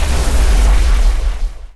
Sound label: revenants aoe layer; muerta